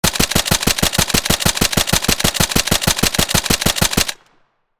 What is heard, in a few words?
AR10 machine gun firing long; guns